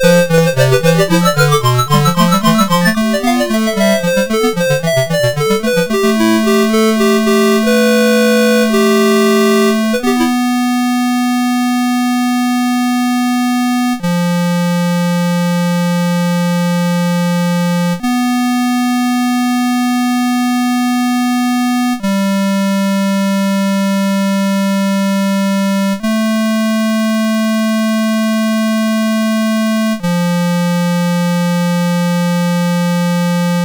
Sweet Robolic Tune, MOS6581R4

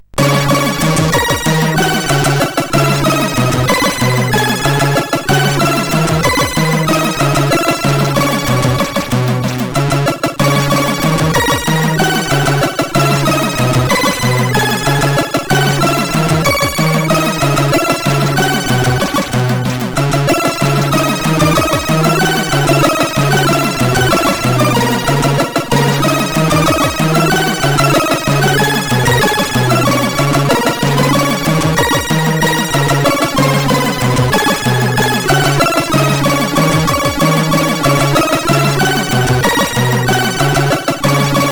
Christmas tune, CSG8580R5

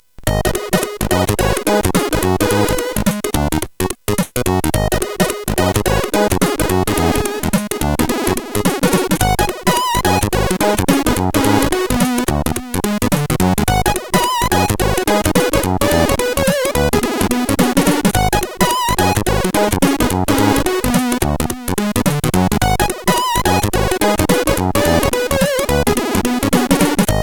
FUNKY, CSG8580; hvsc

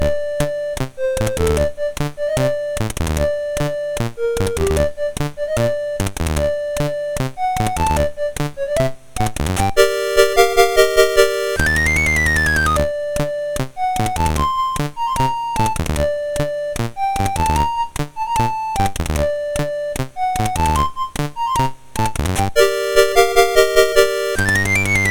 Tango, MOS 6581 R2

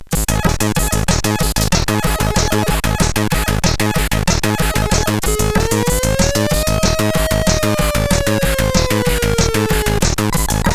Trapish; Signor

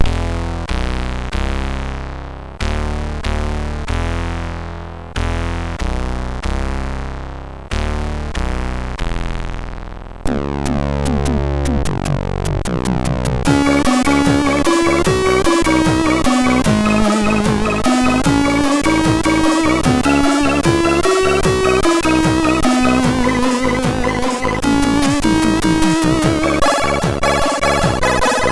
Remix, sid MOS6581R3

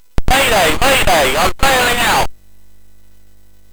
Jump Jet, sid MOS6581R4; hvsc

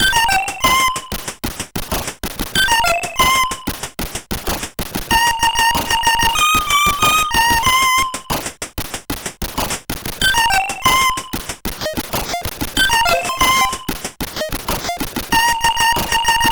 sid CSG8580

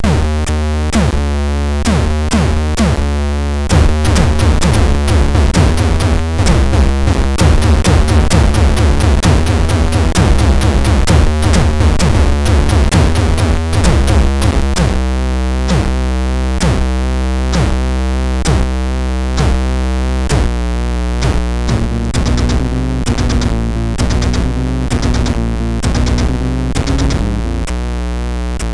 War Drums, MOS6581R4

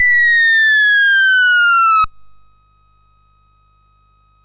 Chopper, MOS6581R4

